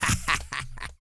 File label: GRUNT, Jazz; Spectrasonics Vocal Planet; Jazz FX